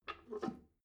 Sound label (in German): drain valve close soft, Badezimmer; haus garten